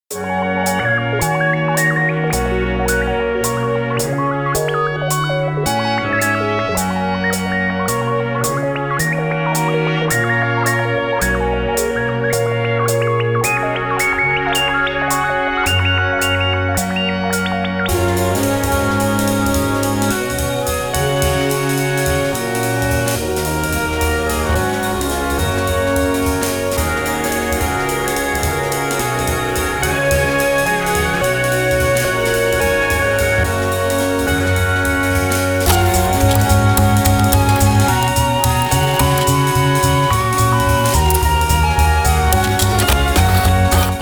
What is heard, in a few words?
fanfare test